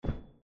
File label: step; csgo; eternity; scp; 049